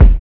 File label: LO FI BD, Drums Dance & Groove; LO-FI KIT; USB Soundscan vol.11; AKAI